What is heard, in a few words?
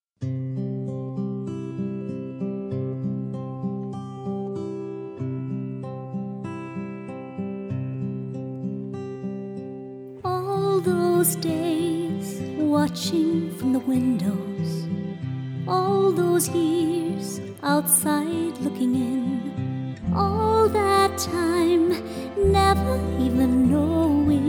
Singing Sample